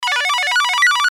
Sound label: Musical Beep Melody; Miscellaneous Musical Sound Effects